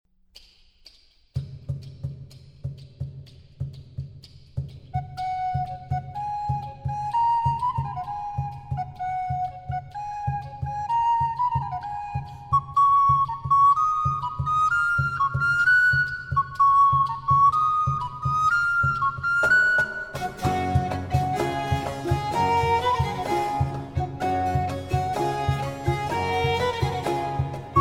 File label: Pastoreta Ychepe Flauta; Pastoreta Ychepe Flauta: III. Adagio